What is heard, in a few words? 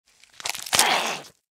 ghoul reaver equip; npc